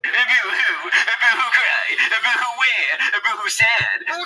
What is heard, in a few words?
boohoo